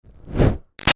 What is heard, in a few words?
Fire Torch Whoosh Med; Flame Sound Effects; Objects